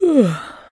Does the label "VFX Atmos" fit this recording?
No